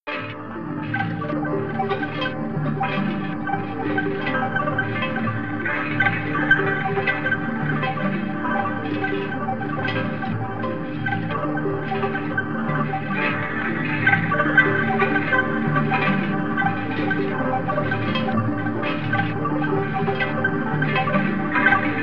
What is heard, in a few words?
Bamboo House